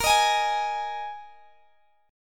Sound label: A sharp 7th Suspended 4th A sharp, Guitar, Open G